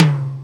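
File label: Hi Clr Wet, Alesis D4 drum machine tom; 300 Drum Machines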